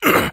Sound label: grunt